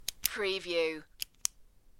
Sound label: Bic Pen On Off